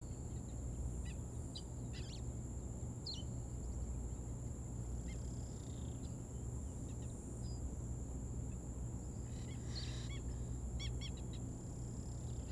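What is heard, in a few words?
Pankot ambience; Lego Indiana Jones 2: The Adventure Continues; Xbox 360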